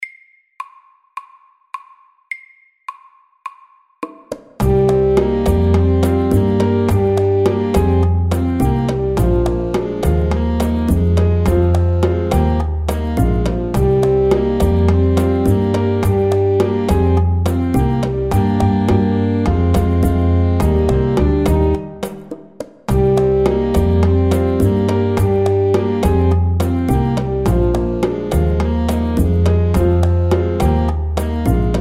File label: Driving saxes MEDIUM 105